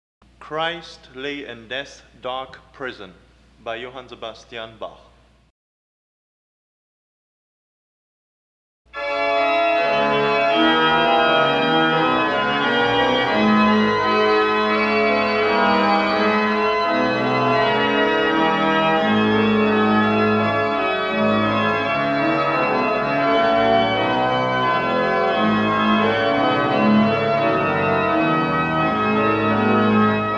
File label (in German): Orgel; Bach; Orgelbuchlien Christ Lay in Death's Dark Prison BWV 625; BWV 625 Christ lag in Todesbanden